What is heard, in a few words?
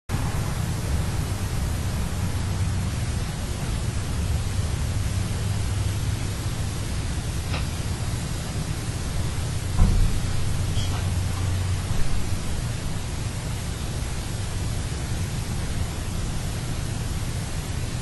whisper